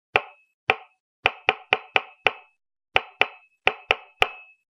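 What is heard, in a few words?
knocking sound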